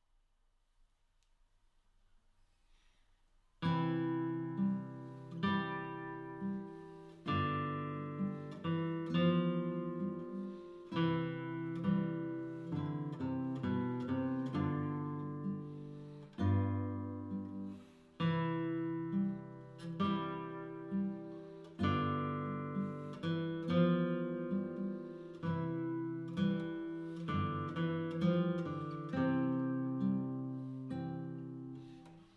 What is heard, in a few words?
Lullaby